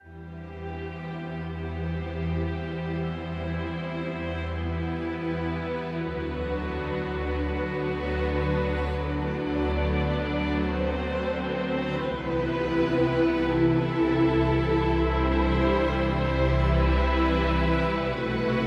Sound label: Poignant Ambient